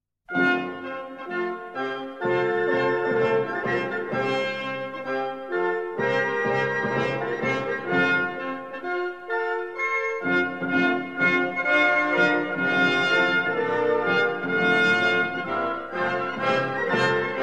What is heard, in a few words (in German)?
Militaermarsch F Dur